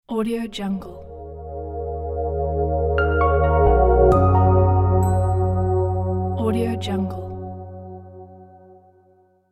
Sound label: modern elegant logo